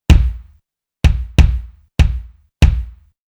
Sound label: POP Kick solo; Finhol